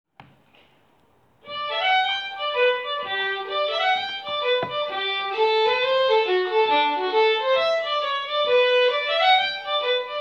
resadale hornpipe

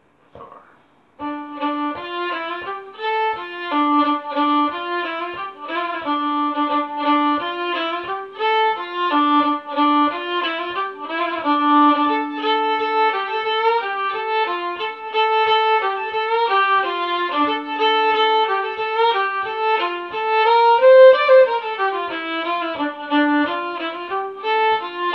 8 step waltz

